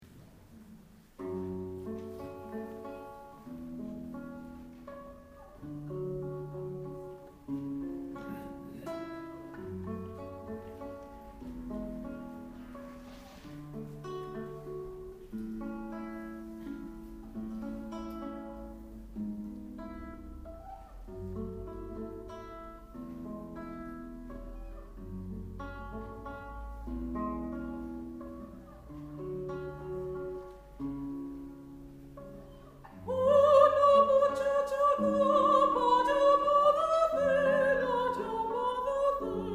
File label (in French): Chant Espagnol